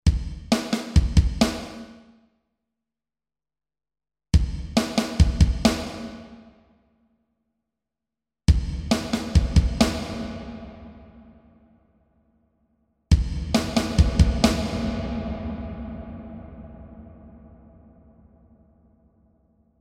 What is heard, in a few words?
efx rev